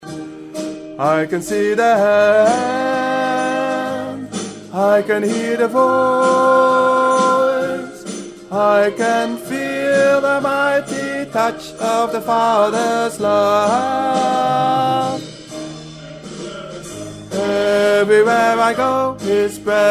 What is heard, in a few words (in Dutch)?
sopraan 2